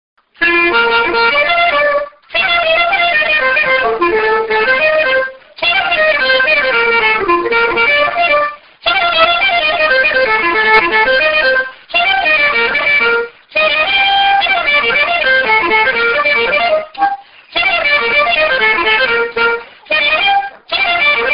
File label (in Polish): walczyk